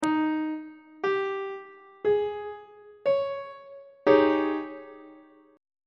g sharp major 7th suspended 4th 2nd inversion on treble clef